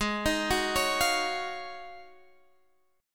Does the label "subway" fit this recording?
No